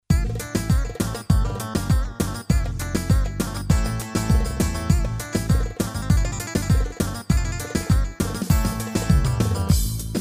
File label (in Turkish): misket, yamaha psr a300tr v2 ritm